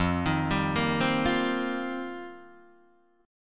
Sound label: F 9th Suspended 4th F Guitar Open D, chord